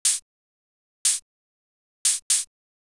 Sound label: juno6 chh; hydrogen